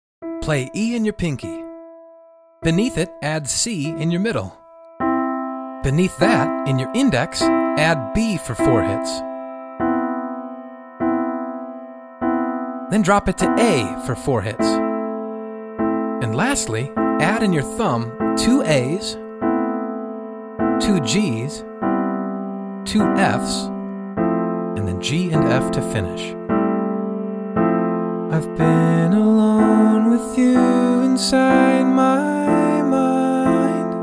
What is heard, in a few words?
easy 80s piano part right hand only